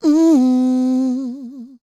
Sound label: MMM, Vocal Blues Soul; Spectrasonics; Planet